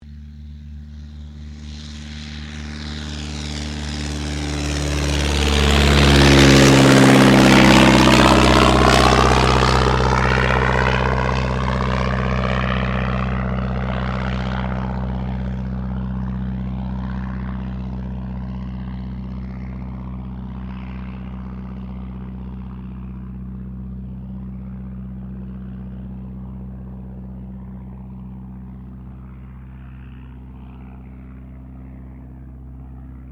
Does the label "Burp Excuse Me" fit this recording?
No